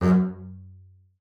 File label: F#2, BASS SPICC; Xsample VOL-5